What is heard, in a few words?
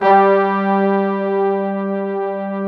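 mellow section G3; MELLOWSCT; Synth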